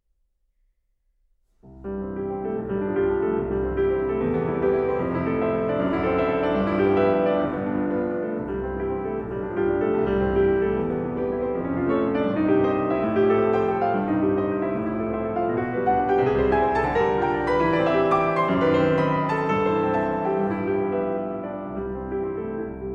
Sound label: Preludes No 01 in C major Agitato; Chopin; Twenty-four Preludes, Op 28